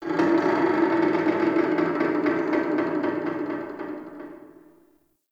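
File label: Metal Creak Distant One Off; Ambience; Warframe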